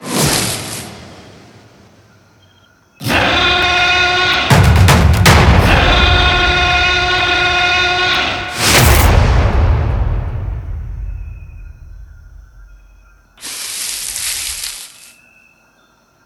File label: superwin; DinoReels81WD; wazdan